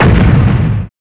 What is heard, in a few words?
large explosion